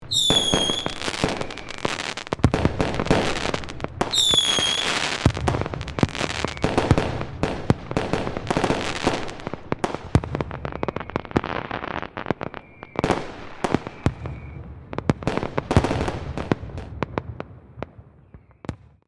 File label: Fireworks display various fireworks and rockets grand finale